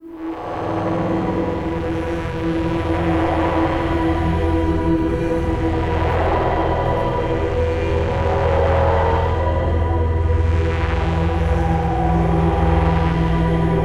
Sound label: Amb Boss